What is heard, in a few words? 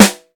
sfx perc